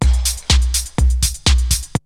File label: LOOPS, TECHNO; Syntec; Wall of Sounds; TECHNO-BOX